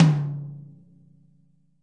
thin tom, Multisampled Real Kit

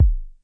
low bass, Roland SH09, drum machines

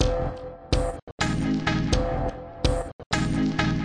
spacious hits; SYNTH AND ELECTRONIC LOOPS

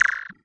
cyborg Cricket; UNUSUAL KITS and FX

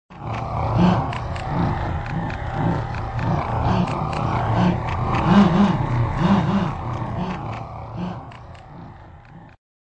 super spooky panning ship, Atmospherics

